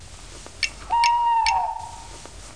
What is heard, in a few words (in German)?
Fenster